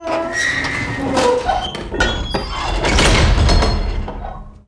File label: door close; DAoC; Toa